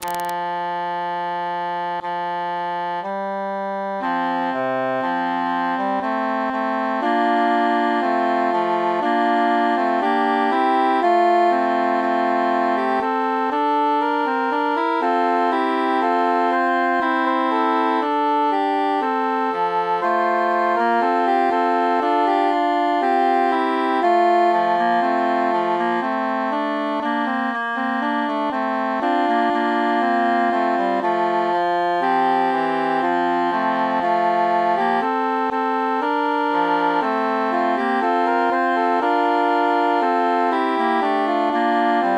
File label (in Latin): sicut cervus bassus mute